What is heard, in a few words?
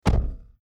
car door